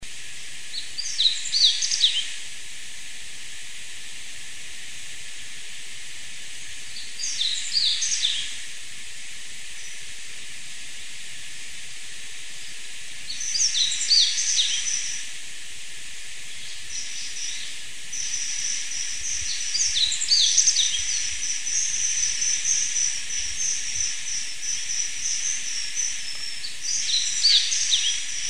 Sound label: varied tit etc